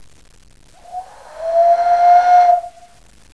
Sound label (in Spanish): silbato; oaxaca